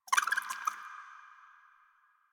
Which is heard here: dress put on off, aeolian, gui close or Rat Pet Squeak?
Rat Pet Squeak